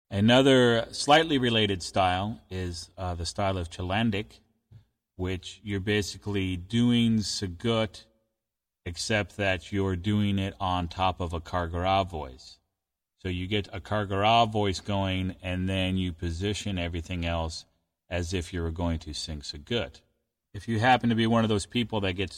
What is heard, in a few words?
chylandyk, khoomei